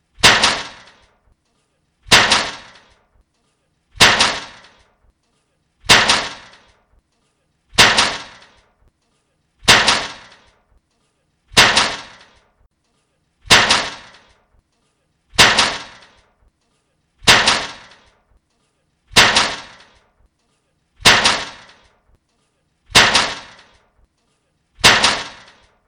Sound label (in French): Boom